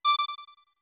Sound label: Notes Music Box D2 short; OOT